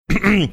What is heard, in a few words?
clearing his throat